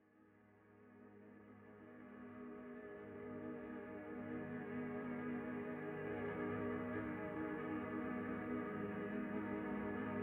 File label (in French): hangar